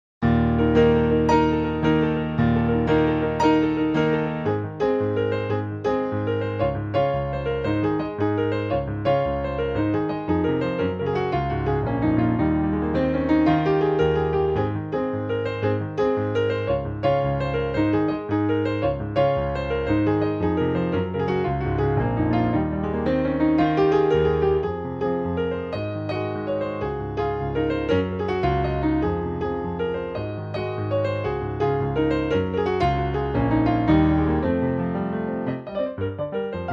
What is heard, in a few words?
Toccata